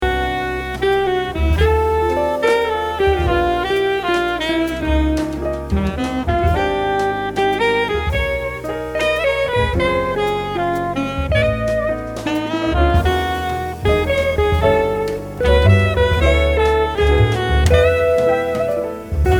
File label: slow fizz